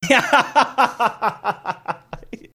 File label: laughing